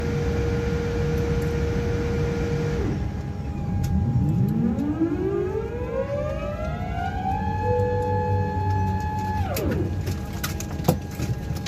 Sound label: 787 hydraulics